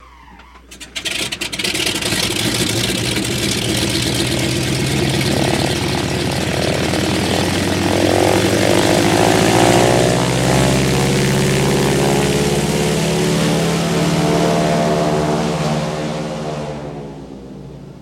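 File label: DHC Start Rev Taxi Away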